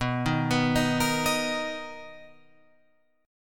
B Major 7th B down Guitar Open F; arpeggiated

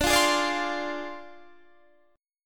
D Minor 7th Flat 5th Guitar Standard; Dm7b5; strummed

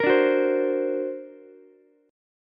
D Augmented 7th Ukulele, chord; D+7 strummed; JGuitar

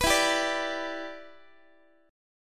E Minor 7th, down, Guitar; strummed

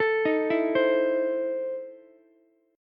E Major 7th Suspended 4th Sharp 5th Ukulele; arpeggiated; JGuitar

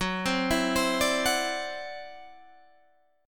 E 7th Suspended 2nd Sharp 5th, F sharp

